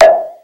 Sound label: REZ NOIZ, TEC INDU PER; Crypton - DrumBase5000